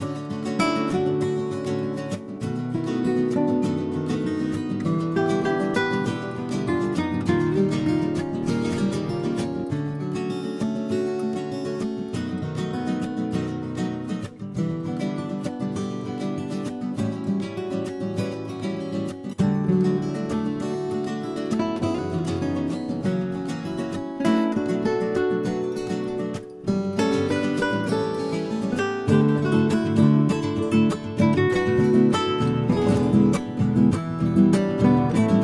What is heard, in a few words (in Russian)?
Gitara muzyka; Gladkij plavnyj